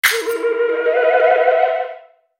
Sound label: Tribal email alert; Nokia Ringtones; Audio themes